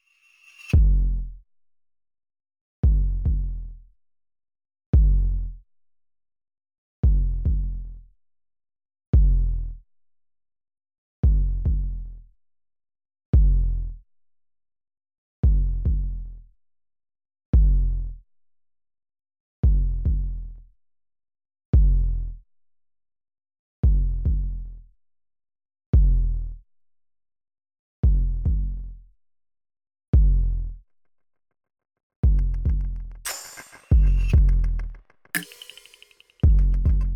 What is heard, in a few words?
Drums II & Perc